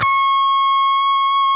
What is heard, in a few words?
PRS D, Guitars, LEAD